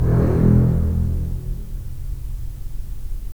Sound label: STR, STR Full Short; Roland - LA Composer vol. 4